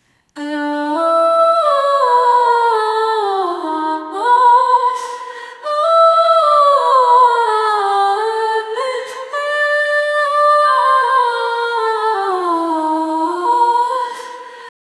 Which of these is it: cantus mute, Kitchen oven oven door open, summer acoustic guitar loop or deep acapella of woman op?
deep acapella of woman op